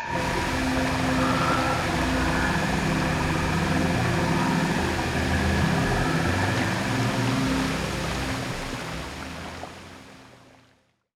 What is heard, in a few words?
tropical paradise; Stellaris; astral planes